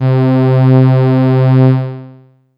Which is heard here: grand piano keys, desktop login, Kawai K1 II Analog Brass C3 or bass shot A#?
Kawai K1 II Analog Brass C3